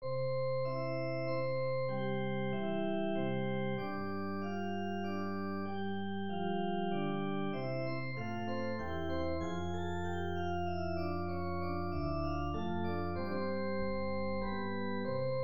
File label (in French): menuet